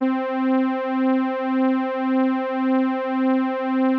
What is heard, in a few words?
Synth String